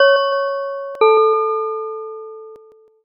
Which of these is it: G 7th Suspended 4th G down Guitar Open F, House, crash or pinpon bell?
pinpon bell